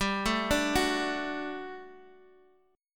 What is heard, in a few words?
G Major 7th Suspended 2nd G, Guitar Half Step Down